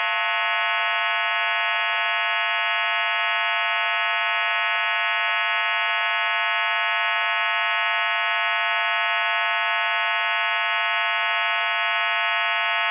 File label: cis-12 idling, psk